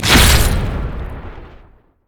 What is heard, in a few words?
savagery five stack; rengar